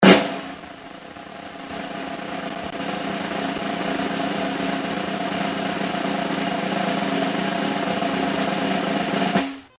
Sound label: Drum Roll Snare